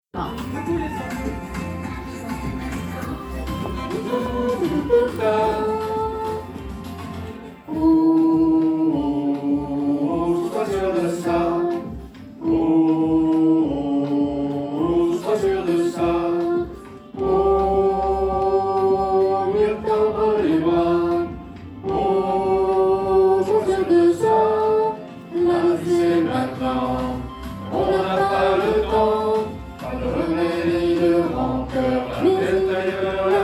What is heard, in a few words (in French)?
Basses; Sequence; Refrain et pont